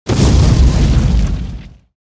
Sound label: explosion